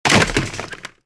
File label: bodyfall trooper; stormtrooper